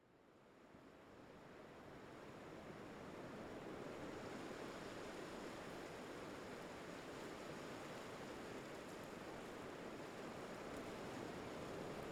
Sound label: Beach, In Stones, Light Waves; Cromer Beach; B-Format; Ambisound; Ambisonic